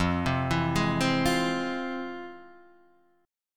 F Minor Major 7th, chord

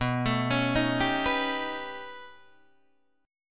B Augmented 9th B down Guitar Open D

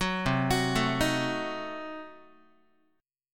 C Diminished 7th down Guitar; arpeggiated; JGuitar

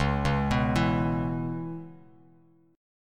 Db Minor 7th Db, Bass Standard; arpeggiated; JGuitar

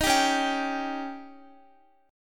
Db Suspended 2nd Flat 5th, Guitar; strummed; x,x,15,12,13,15

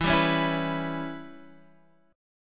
C sharp Minor 7th Flat 5th E

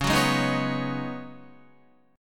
Db 7th Suspended 2nd Suspended 4th Db Guitar; Guitar-4F,4A,5C,5G,5C,6E; Db7sus2sus4 strummed

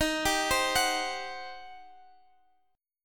G Major 7th Suspended 4th Sharp 5th, Eb

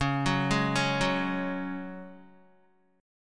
Db Suspended 4th Db, Guitar; arpeggiated

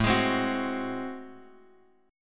A Minor Major 13th A, Bass Standard; strummed; AmM13; 17,23,22,23; JGuitar

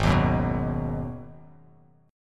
Ab Minor 7th down; strummed; JGuitar

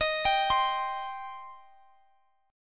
D sharp 6th, Guitar, DADGAD

